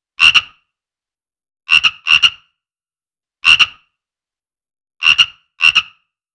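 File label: animal loop frog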